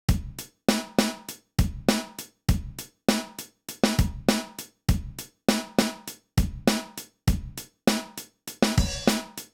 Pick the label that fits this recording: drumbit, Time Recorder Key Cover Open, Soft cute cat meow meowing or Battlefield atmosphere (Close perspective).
drumbit